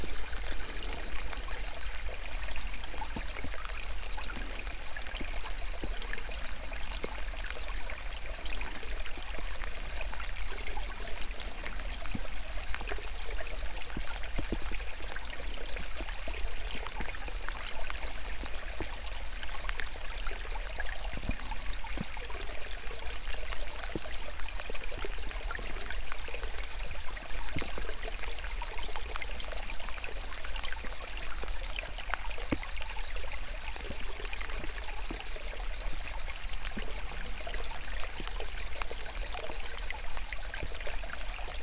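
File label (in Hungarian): pataktól túlról csepegő eső, berki tücsökmadár; Teresztenye; termeszetben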